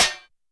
Metal Noize, YAMAHA RM1X

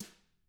TAMA BR P, Drums; ILIO; Double Platinum Drums 1

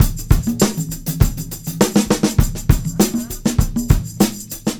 Combo, R&B Drums

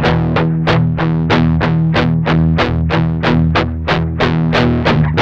med rock 8th, guitars; Spectrasonics; Hans Zimmer Guitars VOL-1; 102-MED ROCK

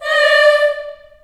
D4 CHS EH, CHILD EHS, Choir; USB Soundscan vol.28; Choir Acoustic & Synth; AKAI

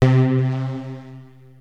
Access Virus B; Synthworx

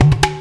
WATERCAN; Best Service; World Colours

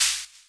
Shaker; Equipped Music; Loungin House

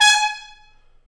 BRS TP STA; Roland L-CD702; BRS Tps Stabs

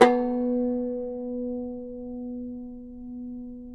CUMBUS, OUD; Roland - LA Composer vol. 3

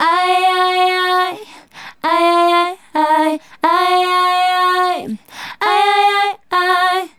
Female Group, Vocal

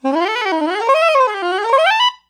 CRAZY UP, SOPRNO

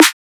drum snare

